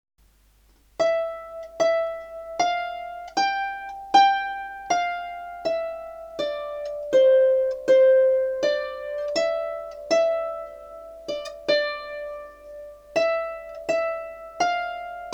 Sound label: Ode to Joy Right Hand, harp